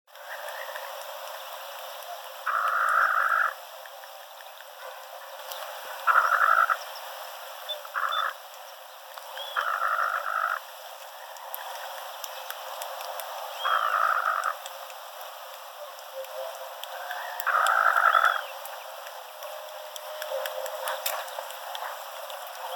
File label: Mangrove rail; پرنده یلوه حرا